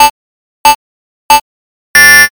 countdown

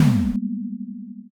shock don

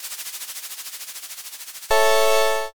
answer roll

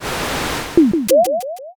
gacha roll

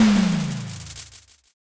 firework burst

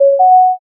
m notify

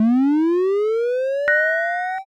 charging

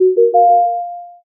success

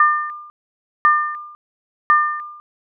radar

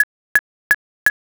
thinking time

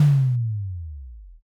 impact don

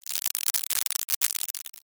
applause

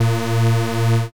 wrong buzzer

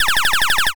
rewind

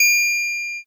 chin bell

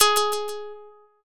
shamisen

